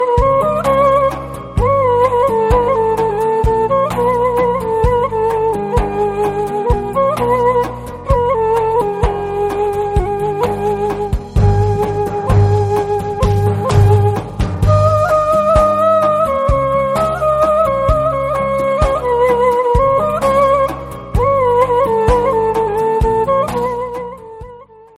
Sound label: new soft arabic melody ringtone